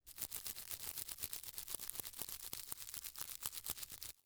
Human Teeth Brushing Teeth Fast

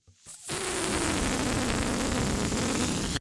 Cartoon Balloon Releasing Air Long; AdobeCC

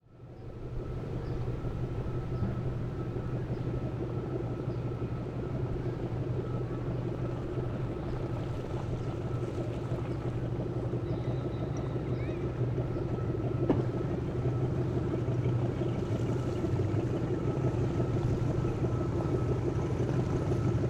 Vehicle Boat Troll By Slow; transportation

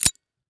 Industry Tool Wire Strippers Trigger

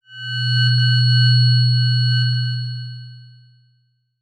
Transition Digital Scanner

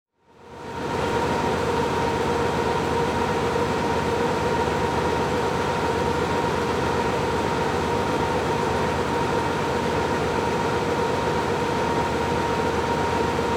Industry Ambience Printing Press From Above

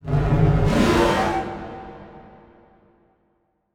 Transition Whoosh Fire; Production Element

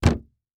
Foley Table Fist Hits Wood Table

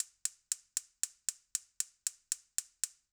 Stove Gas Clicks, Household

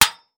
Sword Hit Thin Metal Shield, weapons; AdobeCC

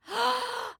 Human Female Gasp

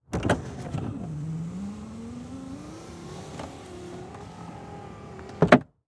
Auto Saturn Open Window Exterior; Transportation

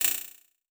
Multimedia Internet CD-Rom Flash Click Multiple

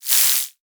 Flash Wipe Electronic; Multimedia Internet CD-Rom Flash

